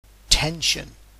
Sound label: tension